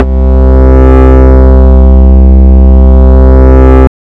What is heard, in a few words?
SY BUZZ; ModelCycles